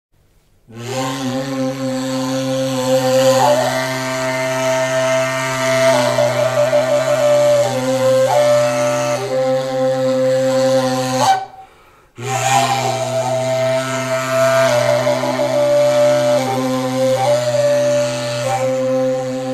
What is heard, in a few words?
Mongolian flute Tsuur